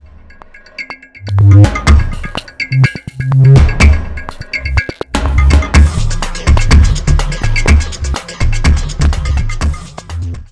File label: music sample cm